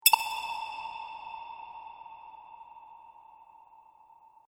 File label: Game Cavern Tokens; Video Game Sound Effects